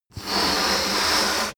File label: balloon blow